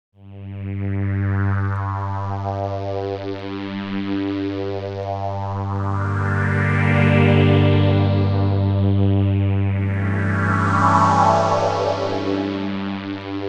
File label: phaser test MDE-X